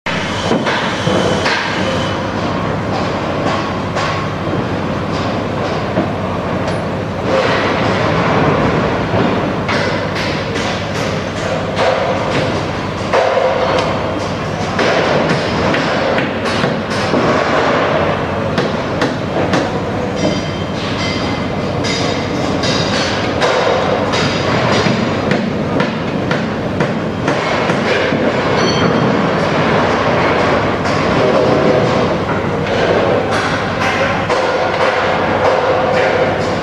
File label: Construction Building Site Sound Effects